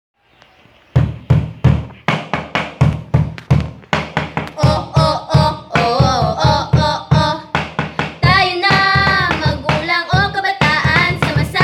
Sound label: jingle; tara na sa brigada eskwela